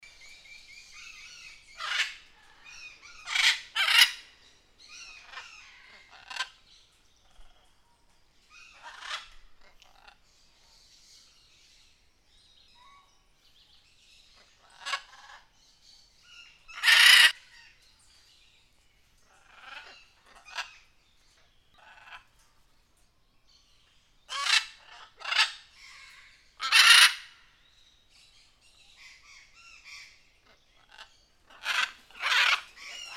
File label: rain forest birds